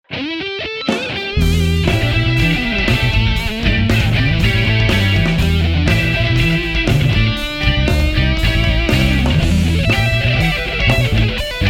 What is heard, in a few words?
Funky Fusion Rock